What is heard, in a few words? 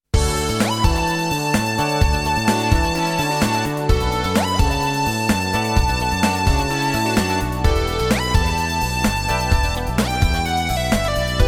Adventure BGM Cheerful; galaxy fraulein yuna music wave 3